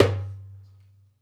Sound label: Dumbek; Essential Percussion